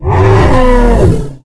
elephant

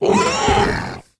boar

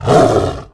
cat

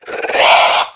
horror

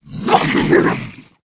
wolf

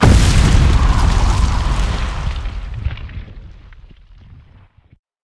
shatter

